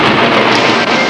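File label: THUNDERS; NATUR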